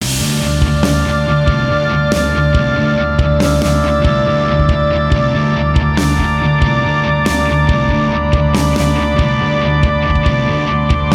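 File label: Ghostly Spooky Building all instruments; CUES for film TV games etc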